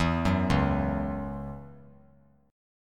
C sharp Minor Double Flat 5th; arpeggiated; 3C,3F,3A,3D; x,11,9,11

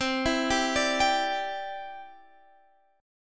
C Major Add 9th, C, down, Guitar, Standard; arpeggiated; JGuitar

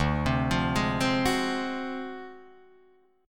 D 7th Sharp 9th Flat 5th, Guitar, Drop D

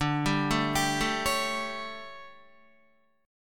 D Major 7th Suspended 4th, D, down, Guitar; arpeggiated

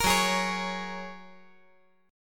F sharp Major Add 9th, guitar; chordshape; down

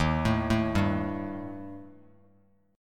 Eb Major Flat 5th Eb Bass Standard; EbMb5 arpeggiated; 11,12,7,0; JGuitar

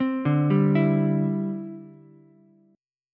D 9th Suspended 4th D down Ukulele C6; arpeggiated; JGuitar

